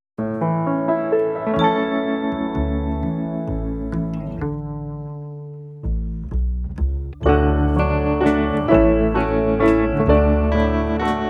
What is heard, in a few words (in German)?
Karaoke Leise rieselt der Schnee